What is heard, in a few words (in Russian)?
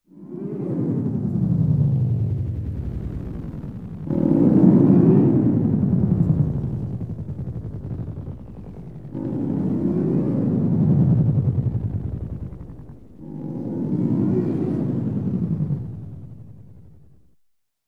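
эффект космического монстра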